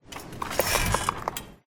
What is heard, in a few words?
Rope Swinging